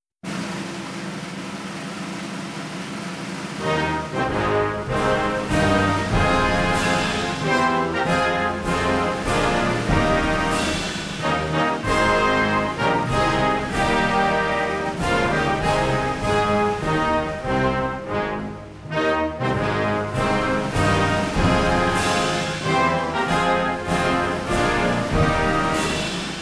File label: THE STAR SPANGLED BANNER (INSTRUMENTAL)